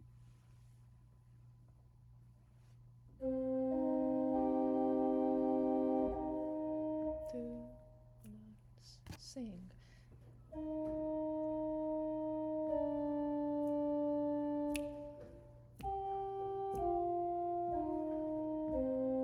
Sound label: Jesu Salvator Alto, choir